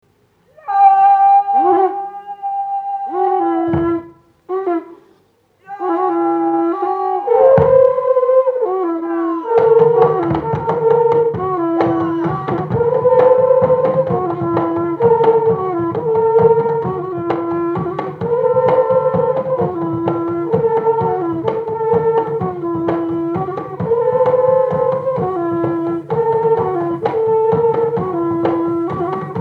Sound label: Amakondera, uganda